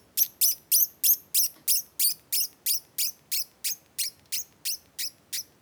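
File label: Lasiopodomys brandtii juvenile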